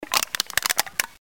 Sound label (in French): Cannette